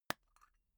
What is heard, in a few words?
tobacco tin open pop